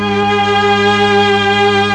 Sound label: STR, STRING; ILIO; Propeller Island Legendary M400